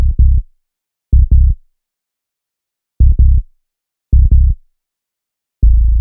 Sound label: Smooth Sub, downbeat